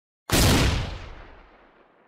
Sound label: Explode